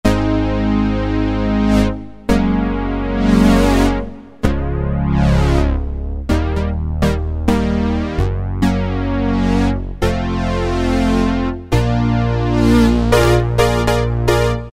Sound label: PolySynth, Roland Alpha Juno-2; Factory B